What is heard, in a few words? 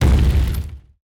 COLLISION BASS; SIMUZENSOUND; Metal Skid Dry